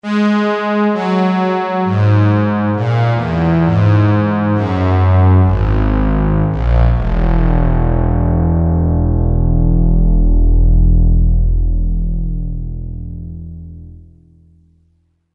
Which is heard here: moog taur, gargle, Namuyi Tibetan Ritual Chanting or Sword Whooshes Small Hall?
moog taur